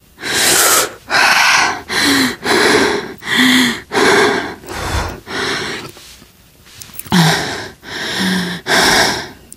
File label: exertion major, teen girl, survivor voice; Left 4 Dead; Source Engine